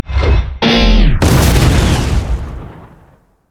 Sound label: death; LoL; mordekaiser